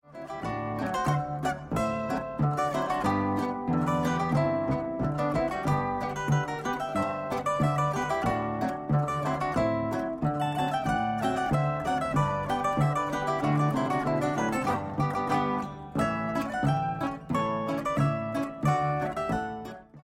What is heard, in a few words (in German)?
boarischer